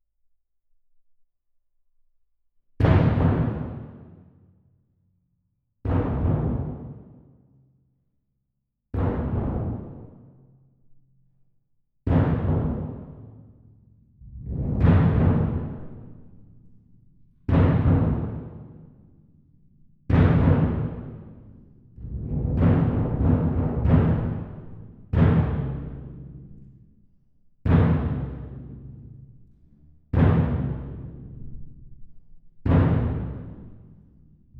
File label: Twin R Perc